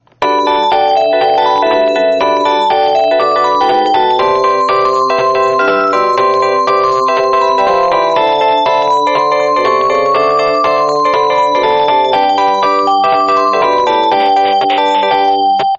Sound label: Panasonic Ring